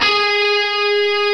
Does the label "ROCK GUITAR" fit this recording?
Yes